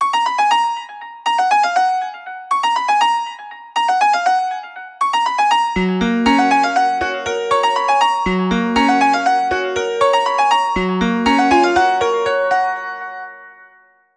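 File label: phone ringtone; X1 Family 2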